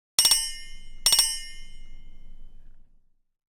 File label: Rides; Nokia Alerts; Windows Phone apps